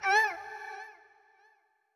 Toxic Mask Hurt

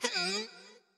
WindUp; Going Under; Wimp

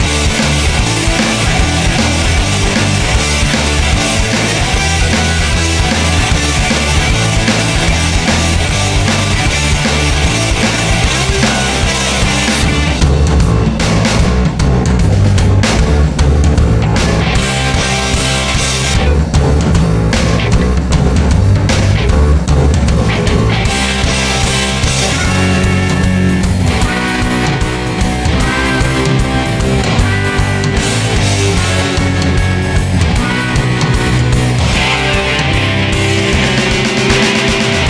Finish Loop; XGames Snowboarder; Paidvacation Finish Loop

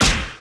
Ball Hit

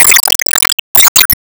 Cardoor Open; gamerip; Enemy Jet Active

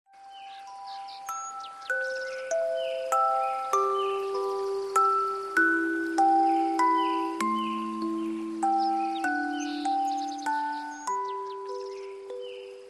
Priestess lullaby

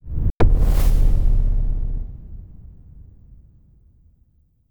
Explosion; Explosion Better; Cobalt Core

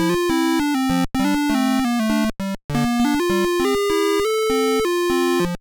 Game Over

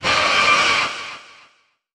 Screech Echo; lethal company

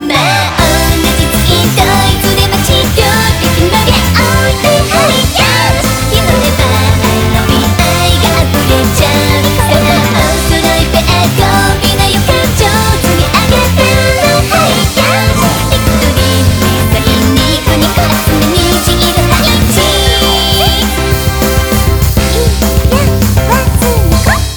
BGM Reach

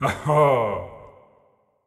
Laugh; Going Under; Marv

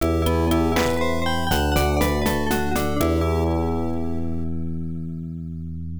Jingle, OPN; Gulf War Soukouden; pc 9801

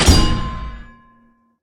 Spike Roof Slam; Lethal Company

